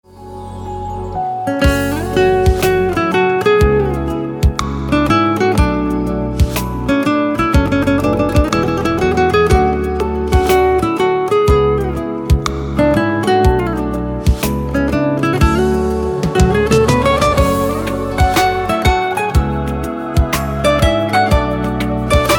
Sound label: Ringtone